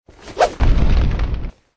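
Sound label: game skills release bow shooting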